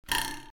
Bungee over

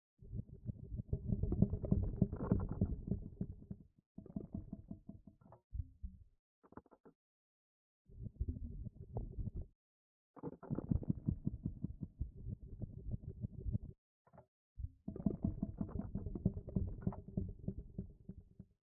Purple Rumble

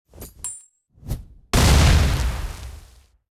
Skill Corrosion Grenade; starbites

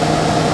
Fan med

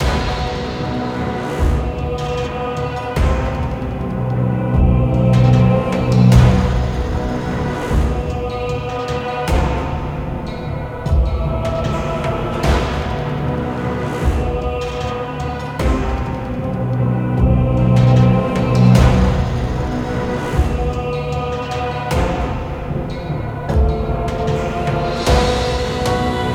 Loop

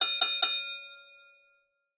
Boxing Bell

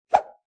Swoosh

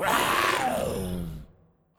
Spirit Death VO

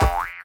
Character Down; hello kitty friends match; android

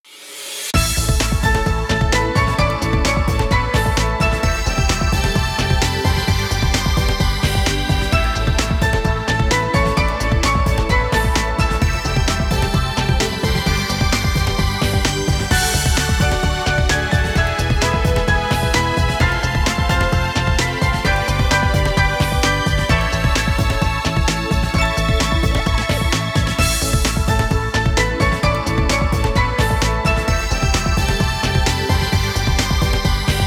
Ending; Yu-Gi-Oh 5D's Wheelie Breakers; Nintendo Wii

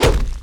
Downslash

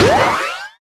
Pickup Jump; Reckless Getaway; Android

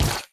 Fungus Mushroom Hit

